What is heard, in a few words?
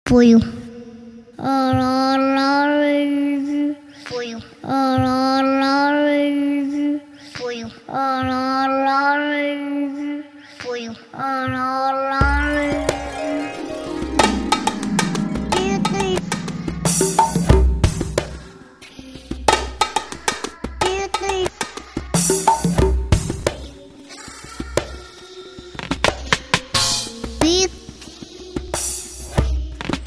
JAZZ